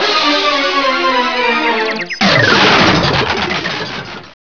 Game End Or Failed; Loony Labyrinth 1.02 Demo

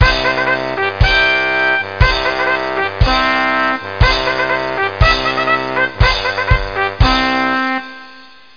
MARCH; Education Publishing Concepts; NOAHDEMO

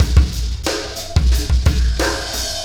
Alien Influence Beat, processed drum kits; Zero-Gravity Beats; Sonic Foundry

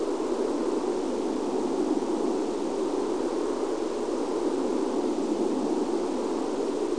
Vent; Apple Expo 95

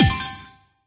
BUTTON CLICK; solitaire haven

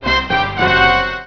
tension; Free Hearts; Gambling

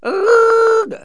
kalooga, cartoon horns

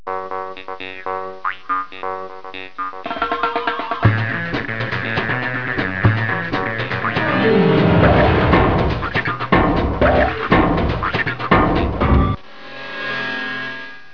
Match sound

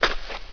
step dirt; SpecOps2 MPlayer Demo

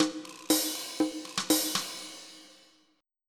rock; TG500

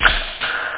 power snap

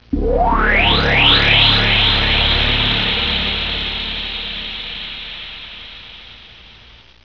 Warp; WARLOCKSNDS

